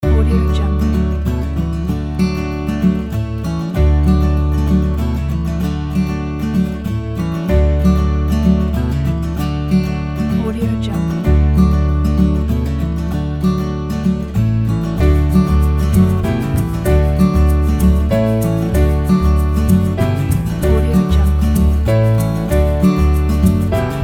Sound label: summer festival